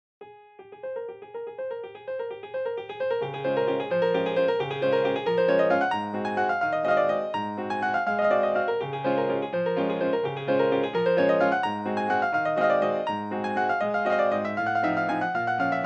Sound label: Kontakt Vienna CG Near Concert Hall